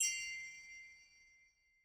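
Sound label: notif chat start received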